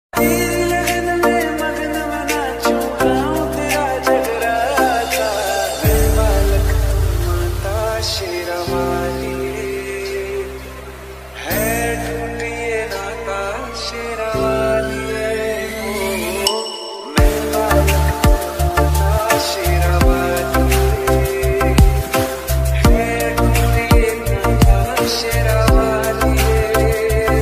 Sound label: dj remix bhakti ringtone